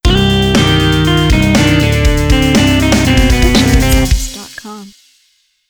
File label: Rock Sound Donation